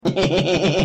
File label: cheap laugh